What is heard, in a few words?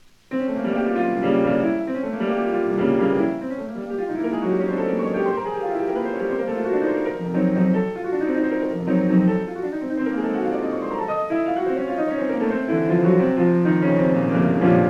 Prelude in F Minor